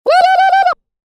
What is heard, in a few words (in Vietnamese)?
Tieng hu; cua tarzan